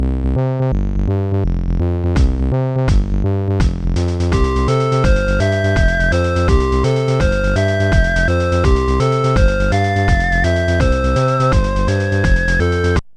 whistle; MusicLine

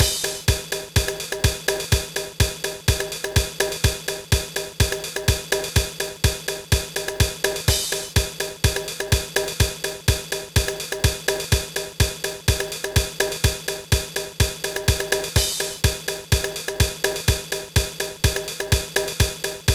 mod drum, Trackers; Modland

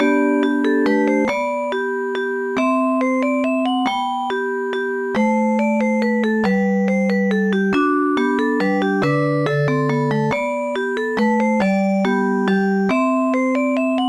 minuet in g; Instant Music

